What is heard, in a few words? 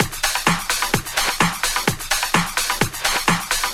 House Loop, 128 BPM; Vengeance Samples